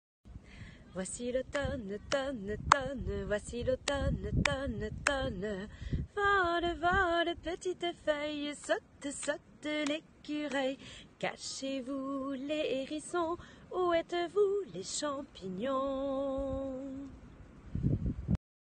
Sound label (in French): Comptine